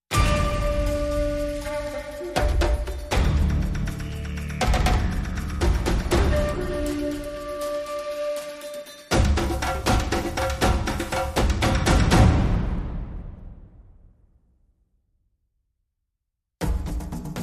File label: No Vocals